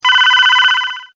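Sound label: alarm clock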